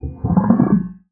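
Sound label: glissando, special fx; Xsample VOL-1; 90 s Sample CDs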